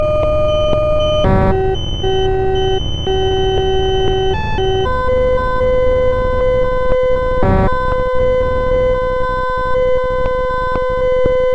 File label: noisy